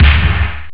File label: snare; OctaMED SoundStudio V1